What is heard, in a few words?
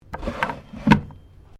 Drawer Cabinet Wooden Small Close; Various domestic drawers; Household